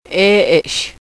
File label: whistle